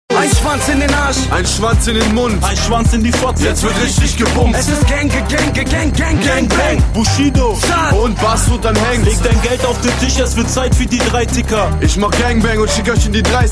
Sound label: electro ghetto